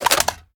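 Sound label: sniper reload mag in; gmod; iw8; alpha50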